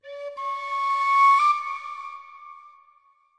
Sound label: native flute; Flutes